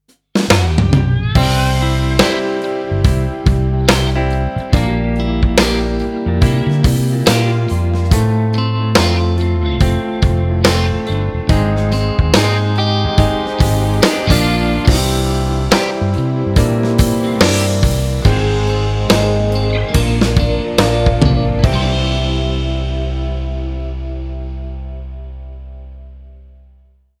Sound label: acoustic pop